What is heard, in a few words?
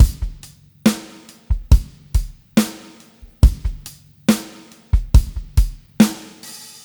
SloMo Beats, Loops 140 BPM; Vengeance - Essential Clubsounds Vol.4